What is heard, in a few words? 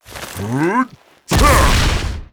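monster attack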